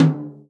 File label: tom, Real Drum Kit, jungle kit